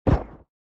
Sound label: bullet stone; out of reach treasure royale 2020 gamerip pc